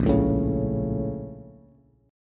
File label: Bb Minor Major 7th, Bass; BbmM7; strummed; JGuitar